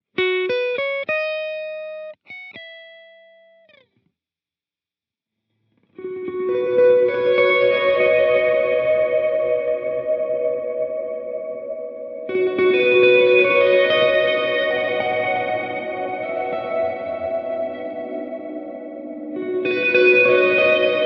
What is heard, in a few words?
Ambient